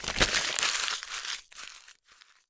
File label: Paper Crumble; The Looker